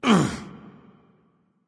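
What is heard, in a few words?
nonverbal grunt lift; smite; voicepack; ullr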